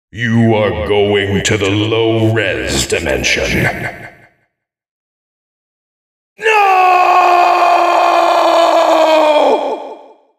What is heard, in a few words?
low res dimension